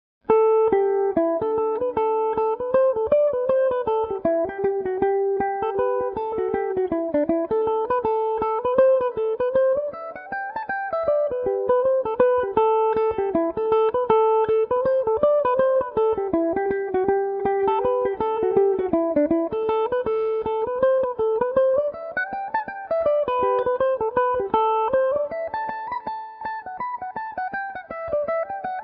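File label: A dorian, irish mandolin; paddy on the turnpike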